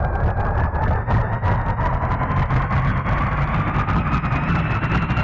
eng accel dmg

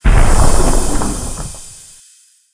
item gas tool

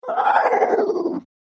wookiee f attack; afflicted

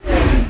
wep executioners hack

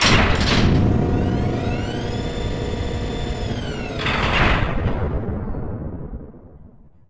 door large powered

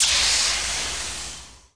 probe thrusters

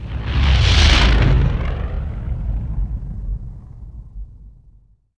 flyby; millenium falcon

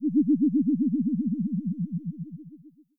senatepod engine decel

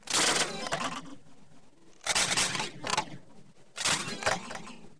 sys manipulation generic

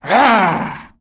afflicted f attack

